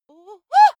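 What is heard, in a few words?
female scared comic, screams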